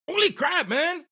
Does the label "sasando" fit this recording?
No